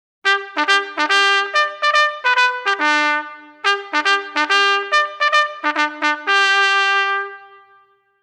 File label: Secure, drum corps